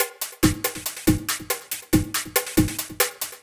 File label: Loops 140 BPM Hihat Percussion Loops